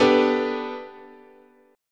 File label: B Minor 7th Sharp 5th, guitar chord